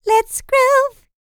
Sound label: LICKS, Vocal R&B Dance; LT DANCE